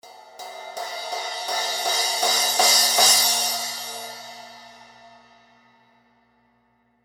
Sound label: splash crescendo hits